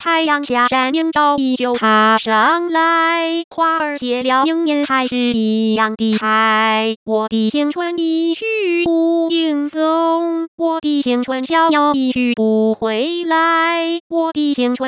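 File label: synthetic singing voice, 女聲, original; 使用頻譜包絡內插之國語歌聲合成 (Mandarin Singing Voice Synthesis Using Spectral Envelope Interpolation)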